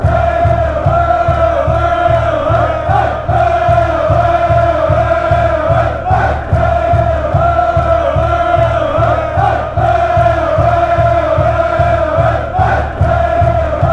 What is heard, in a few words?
hey, chants; gnt